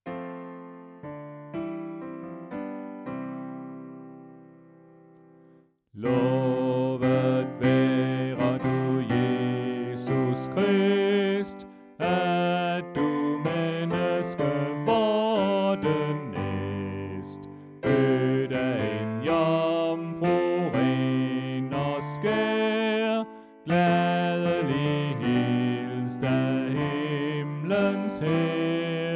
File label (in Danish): Bas